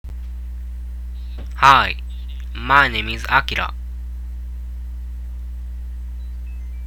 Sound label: power noise